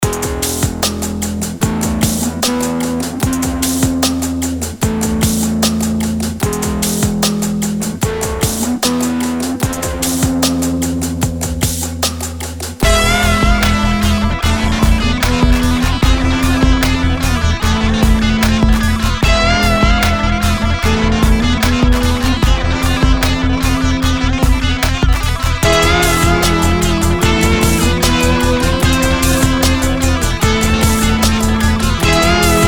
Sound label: Rock Guitar Trap